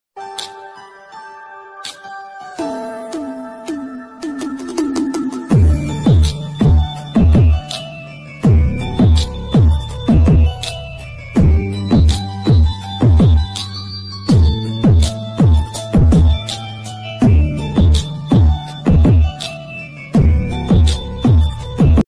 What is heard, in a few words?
love ringtone